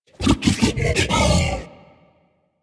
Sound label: Juggernaut Truffle Found, Infested; Warframe; Truffle Hunt